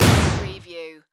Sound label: Hit Laser